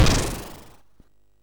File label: SFX, MOS6581; Narco Police; MOS6581R3